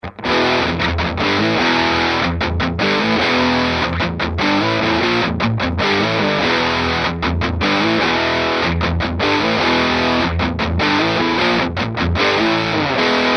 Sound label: Riff deep normal hot US vintage; WowaRiff; Tweaker